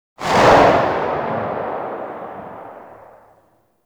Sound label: Revolver Rifle Tail; Warframe; Weapons; Tenno